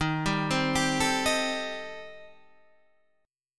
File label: Eb 6th Flat 5th Eb, Guitar DADGAD; down